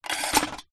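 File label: industrial tool long slide into rack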